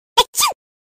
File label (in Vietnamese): tiếng hát xì hơi dễ thương nhạc chuông tin nhắn